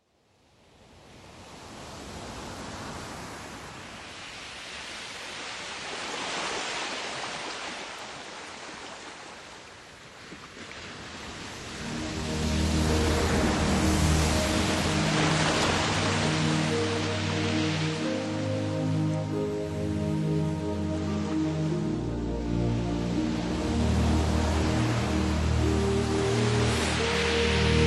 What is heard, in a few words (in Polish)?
Muzyka Relaksacyjna POLINEZYJSKIE SPA